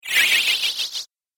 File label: Game App Spin, Video Game Sound Effects